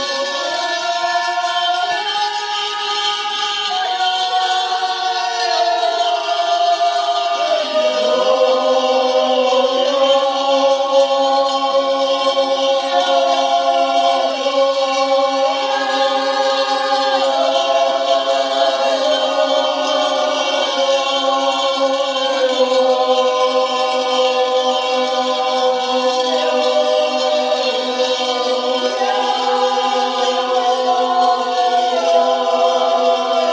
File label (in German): jodelkurs; reise; tonaufnahme